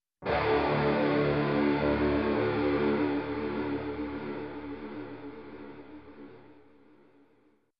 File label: Dark 80 s rock guitar strum stinger